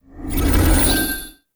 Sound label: Games Sub Menu Out, Transition Audio; XDK4627 BIOS and XDK Launcher and Dashboard(for Retail); ogxbox